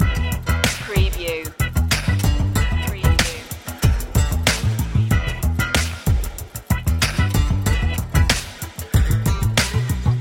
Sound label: dope sax loop